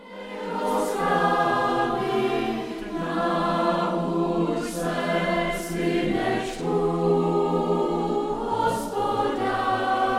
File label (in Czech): lidová Ach synku synku